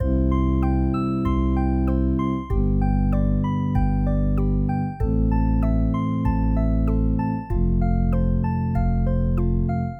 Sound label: warm inspiration 96bpm loop